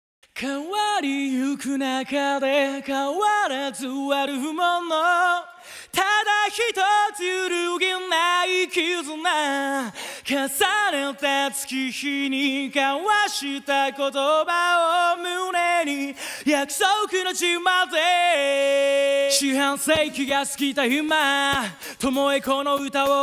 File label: Acapella; Gintama